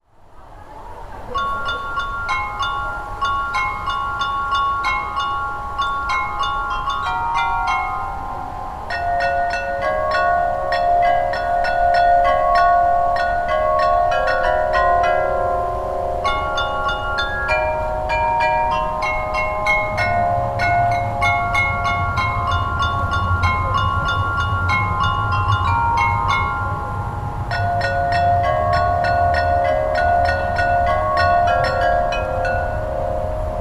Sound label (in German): Porzellanglocken Zwinger